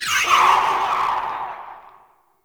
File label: Rifle Charge Projectile, Weapons; Warframe; Zariman Grn Wraith Rifle